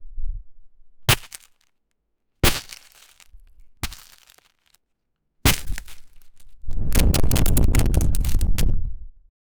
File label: body damage